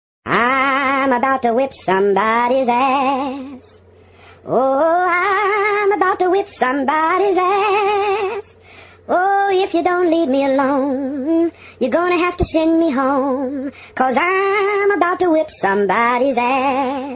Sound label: crazy duck ringtone, funny ringtones